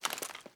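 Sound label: submachine gun reload empty gun rattle; gmod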